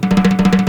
drum; Crypton - DrumBase5000; AFRICNRHYTHM

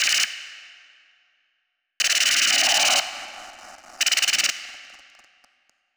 Noise, Processed Noise

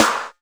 HI POP SNA, Drum Mashines, SR-16 DRUMS; EdgeSounds

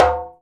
JEMB, DJEMBE; Univers Sons; Basicussions

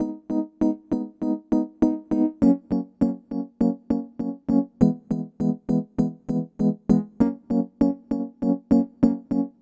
EP, Keyboards, Electric Pianos

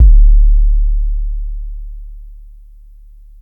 BASS SUB; Big Beat Samples & More

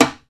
CLSD RIMS, Timbale; Timbale Battery

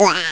uaaa; ST-XX Amiga Soundtracker Sample Packs

